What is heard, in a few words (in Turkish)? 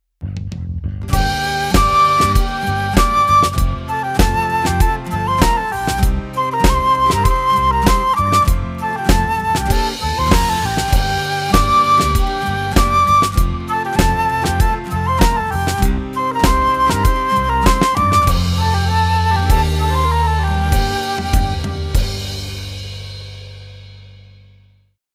yan flüt